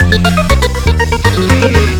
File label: victory; tf2ware ultimate; music game; wii 9volt